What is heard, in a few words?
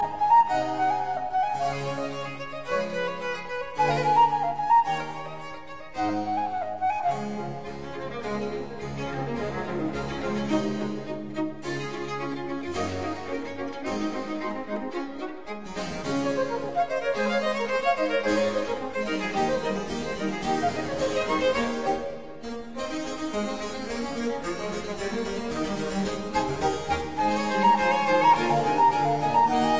Quartet Allegro; Quatrieme livre de 6 quatuors; No 1 in D major; TWV 43D4